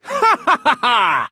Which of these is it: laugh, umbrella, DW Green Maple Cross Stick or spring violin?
laugh